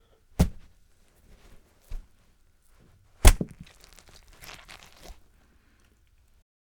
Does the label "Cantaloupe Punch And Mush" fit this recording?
Yes